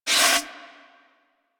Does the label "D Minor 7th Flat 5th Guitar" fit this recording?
No